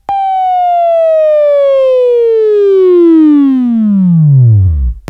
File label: Buzz